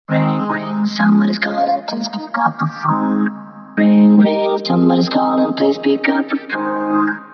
Ring Tone Lovely

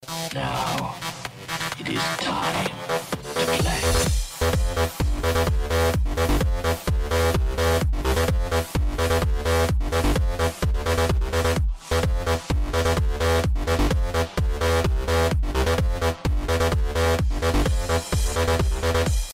Bass

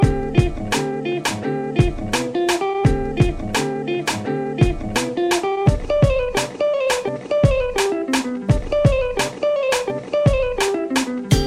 Elevator Music

